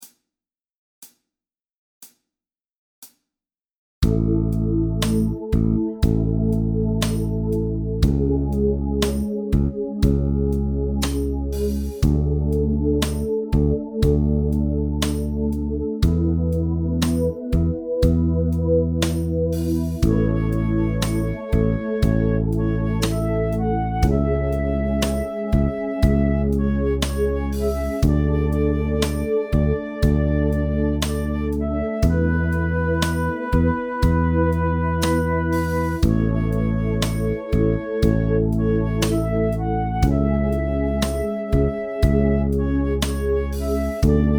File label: Key A3; Piano / Teclado